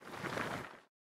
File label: Ground Up; Folk Hero